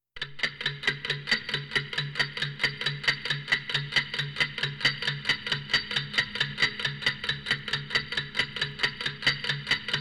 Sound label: Clock Tick Reverberate